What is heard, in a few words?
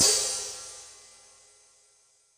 Hi-Hat, Yamaha DTXpress; drum machine; Hp splsh